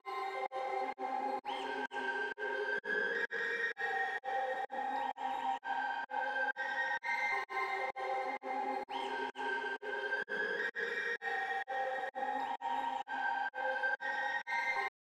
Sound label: 129 BPM C Min Pad Pitched, melody loop stem; Trap; Loop Stems; Cymatics; 2022 Melody Collection - Trap Pt 2; Richer Than Ever